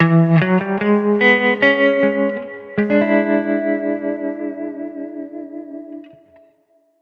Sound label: complete sting